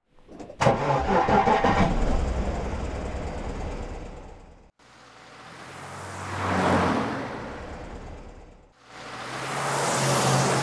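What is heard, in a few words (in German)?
AUTO, Stadtgeräusche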